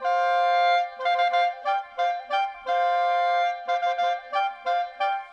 Clarinets, Orchestral; Sonic Foundry (Sony Creative Software); Orchestral VOL-2; Modern 25

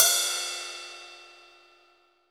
RIDE, ROCK RIDE CYMBAL

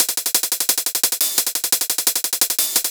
D&B Percussion Loop; neuro

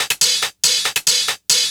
NRG Cymbal

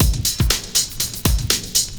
LOOP, 70's Breakbeats; USB Soundscan vol.46; AKAI

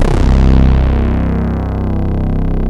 Bass, Synth Bass Loops; Basses; Sonic Foundry (Sony Creative Software); Groove Elements

